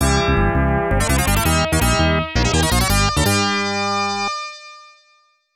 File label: All Stages Clear